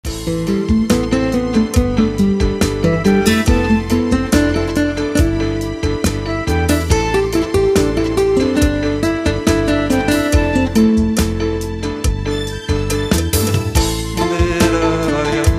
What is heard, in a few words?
bossa